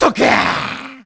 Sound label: Hit; Salvatore; zelda sounds